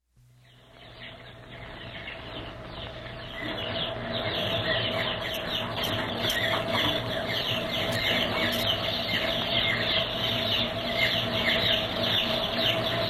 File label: Chicks in a poultry